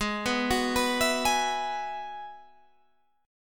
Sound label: Ab Minor 7th Sharp 5th Ab, down, Guitar, Open G; Abm7#5; arpeggiated